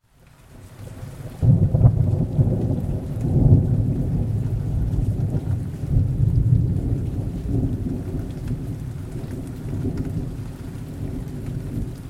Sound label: Thunder